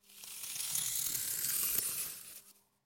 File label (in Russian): lunnyiy grunt skripit pod nogami; zvuki lunyi